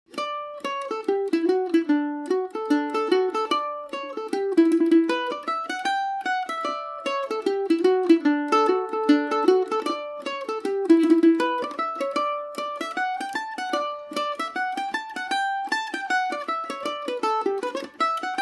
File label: miss galvins hornpipe dmaj, mandolin